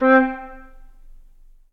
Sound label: Concert Flute Solo Staccato; Ableton; Orchestral Woodwinds